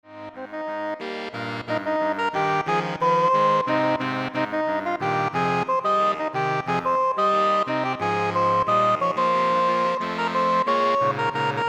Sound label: Saxophone Ensemble